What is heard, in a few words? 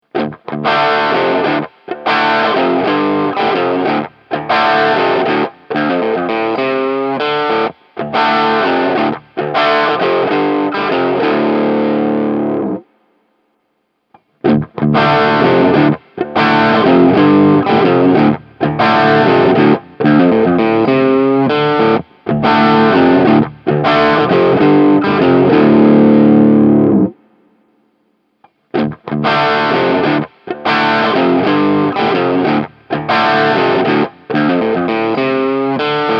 IR Cab Slave Change; Hotone